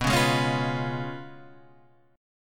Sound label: Db 7th Cb, guitar; Db7/Cb strummed